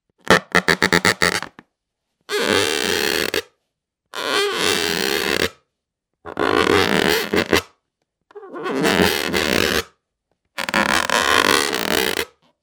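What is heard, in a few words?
balloon sound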